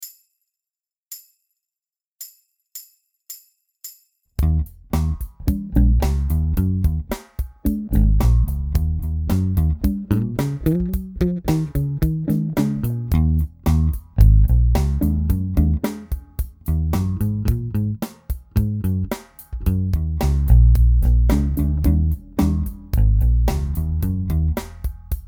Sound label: R&B, bass